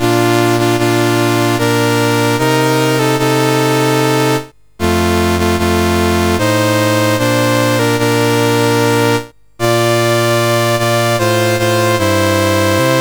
CSG8580R5, National Anthems; Switzerland

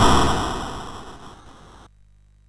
sid MOS6581R2

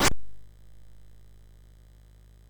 sid, MOS6581R4; Classic Concentration

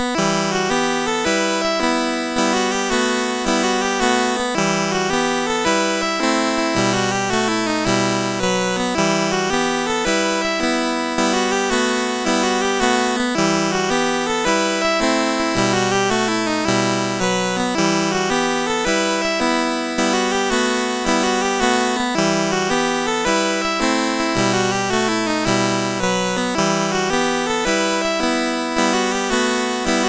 MOS6581; There was a Pig went Out to Dig; MOS6581R4

